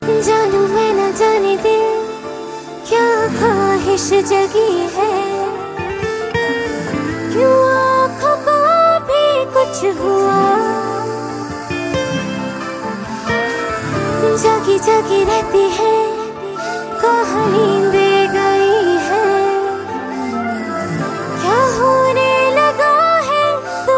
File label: Female